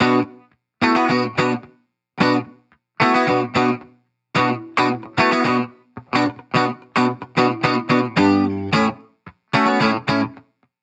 Guitar Bpm110 Key B minor Full, 70s Guitars Full Mixes; Fox Samples; Back to the 70s Guitars